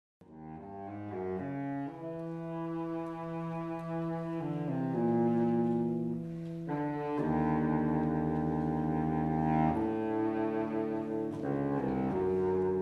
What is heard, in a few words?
Baritone cello